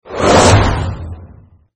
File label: wind